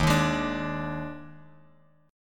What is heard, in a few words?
Eb Major 7th Suspended 4th Eb, Bass Standard; strummed; EbM7sus4